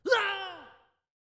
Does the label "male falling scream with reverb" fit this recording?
Yes